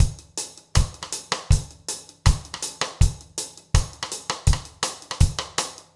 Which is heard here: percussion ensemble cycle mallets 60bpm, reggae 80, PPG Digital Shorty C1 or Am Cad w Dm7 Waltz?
reggae 80